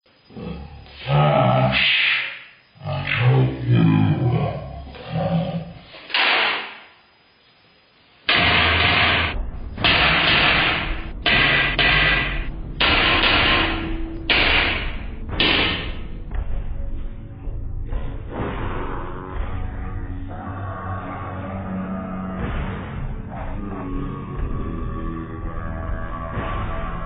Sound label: rolling pin; vapesquared